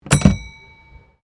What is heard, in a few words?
Tram Cable Portland 1800s Bell Ring; Portland Cable Tramcar; Streetcars; Vehicles